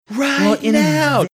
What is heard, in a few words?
singing right now